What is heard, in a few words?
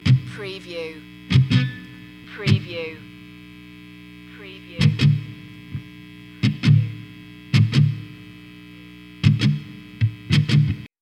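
Dist Guitar Noise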